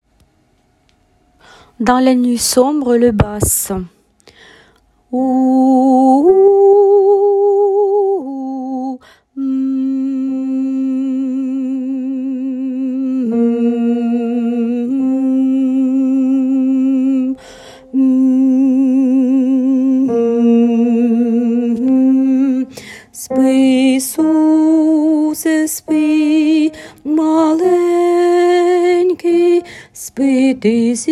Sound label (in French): basses; Berceuse ukrainienne de Koluskova